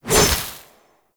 Deco Hover Whizzby; Envy; Warframe; Warframes; Pax Duviricus

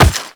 Run Rock, Footsteps

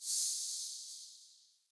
Alley Distant Chains; UnderCity

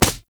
Melee Fist Impact Generic; Fists

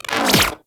Split Laser Retract; Warframe; Weapons; Corpus

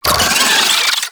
Wall Climb Movement Vocal; Warframe; Oraxia

